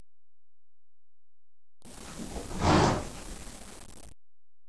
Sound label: HORS; animal sounds